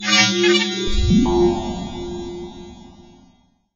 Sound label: notification denounced; Stellaris; federations